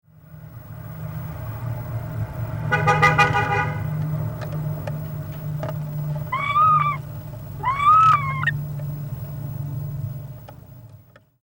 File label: starling